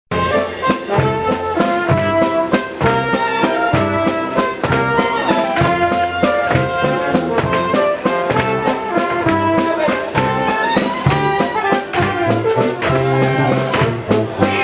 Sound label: oompah, Bavarian Oompah Band; The Edelweiss Band